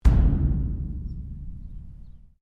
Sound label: Strike Metal Hollow Tank; Materials